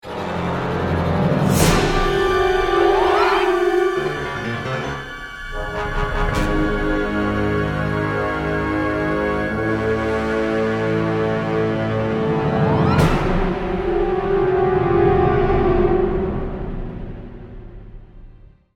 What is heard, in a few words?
Horror Sci-fi